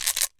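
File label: COW RATTLE, PERC; AKAI S6000 CD-ROM - WorldSounds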